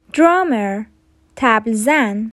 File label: Drummer